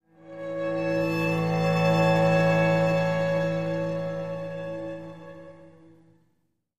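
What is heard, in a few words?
Music Transition Cello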